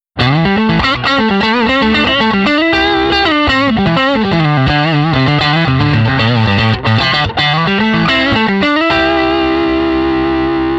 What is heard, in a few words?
Sparkle Drive Half Clean